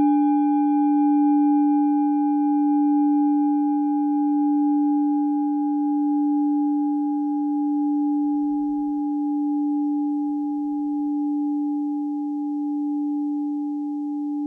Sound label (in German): klangschale ladakh